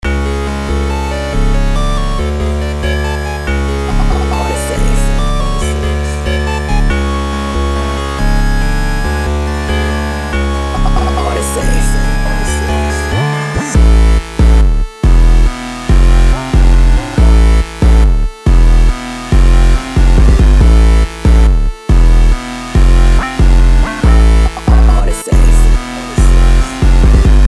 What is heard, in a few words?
perc